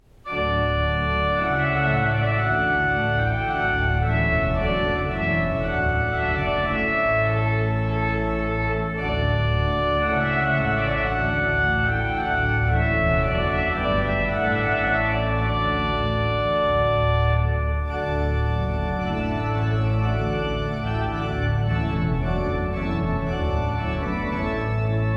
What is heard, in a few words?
Trumpet Voluntary; Purcell